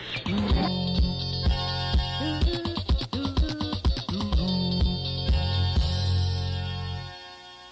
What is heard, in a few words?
whimsical new wave